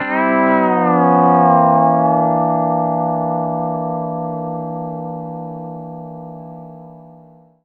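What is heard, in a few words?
pedal steel chord C9 R; PEDSTL CHORD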